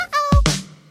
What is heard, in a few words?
Uh-oh; ICQ